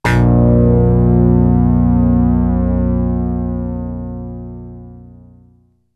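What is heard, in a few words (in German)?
synth flaeche; Best Service Gigapack 1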